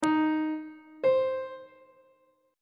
relative minor of e flat major is c on treble clef